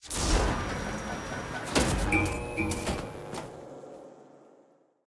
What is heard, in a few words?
Fusebox Success; Puzzle